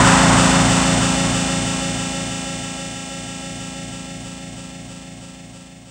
D+B

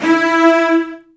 Virtuoso Strings, TRM FX

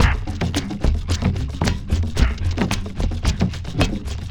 ELECTRO ACOUSTIC MELT; Sonic Foundry (Sony Creative Software); Vir Unis Infusion

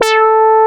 ROLAND JX3P A4; Big Fish Audio - First Call Vintage Keyboards

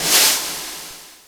brush roll s, Jazz Brush

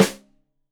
Rim Shot Stereo, drums; Deeply Sampled Drums

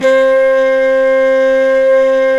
WND FLT MT, Flute & Mute

